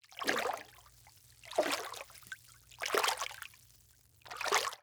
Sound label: walk water